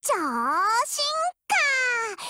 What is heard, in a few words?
start; m4mod